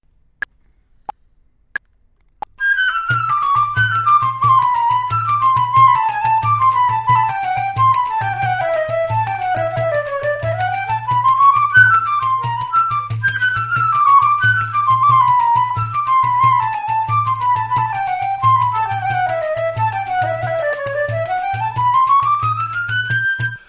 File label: Flute Pandeiro; Apanhei-te, Cavaquinho; Nazareth